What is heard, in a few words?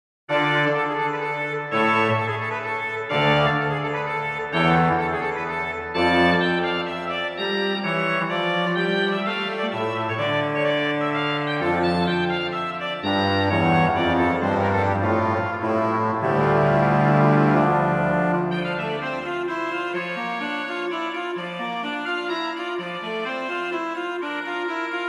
Kyrie Grand Choeur; Beauvarlet Charpentier JJ Journal; Messe Rem